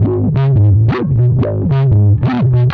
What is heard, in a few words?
Bass 175bpm, Drum and Bass; EZ Rollers; Percussion